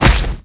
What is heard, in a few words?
Fight Magic Ball Damage; Mighty Party; Android